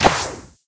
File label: Bow